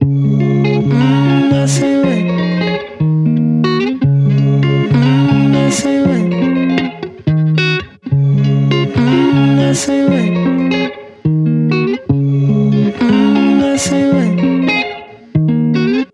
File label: 119 melodic stack Dbmaj; isle nasewhey